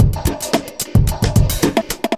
evil beats 110

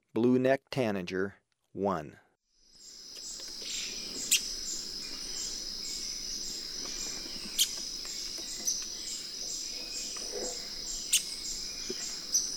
Blue-necked Tanager, Birds of Ecuador; S. America

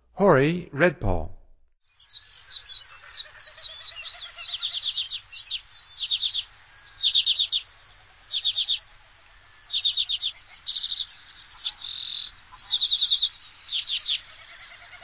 Hoary Redpoll, Songs of Birds of North America; Stokes

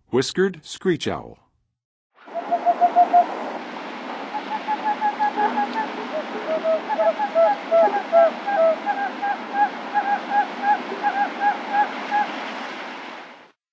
Whiskered Screech-Owl, Birds of North America; Western USA; Western USA Songbirds

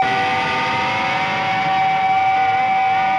Slide Feedback Wail, Guitars; Rock

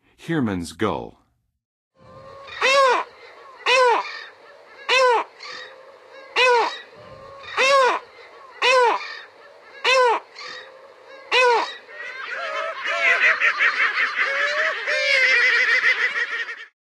Heermanns Gull; Western USA Songbirds; N. America; Songs of Birds of North America

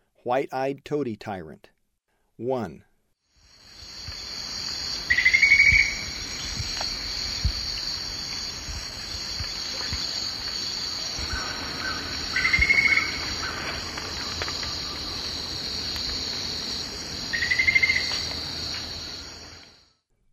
White-eyed Tody-Tyrant; S. America; Eastern Lowlands

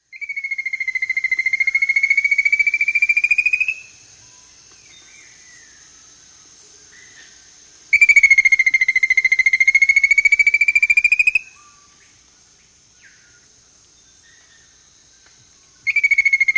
Rufous-Capped Antbird; S. America

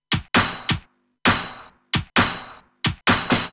Snare Loop, Dance Beats

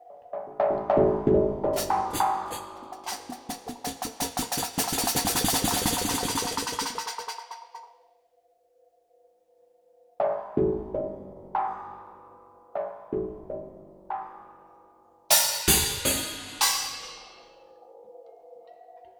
Kabuki Metal, Rhythmic Atmospheres; Ambient Cinematic

